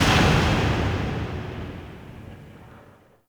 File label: Factory Thud, Ambience; Warframe; The New War; Zariman